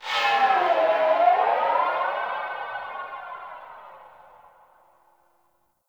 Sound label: Corpus Ship Tech Flow Texture Med; Textures